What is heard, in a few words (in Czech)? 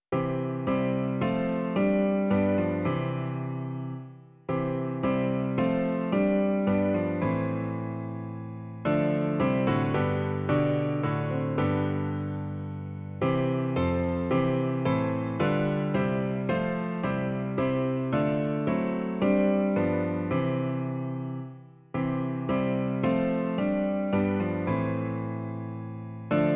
klavír; Jak jen se odvděčím; Klavírní verze